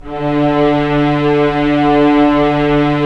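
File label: STR SC, strings; Roland - LA Composer vol. 4; Full Sec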